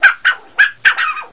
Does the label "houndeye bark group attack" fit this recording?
Yes